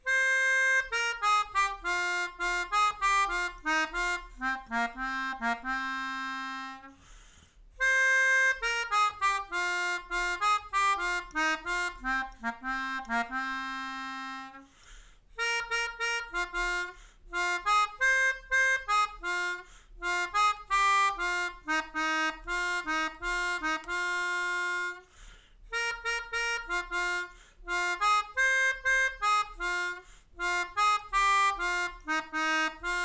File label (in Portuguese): Melodica